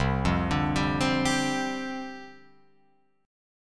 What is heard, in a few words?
C Suspended 2nd Suspended 4th Guitar Open F, Csus2sus4 chord; Csus2sus4 arpeggiated